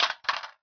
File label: mag drop; csgo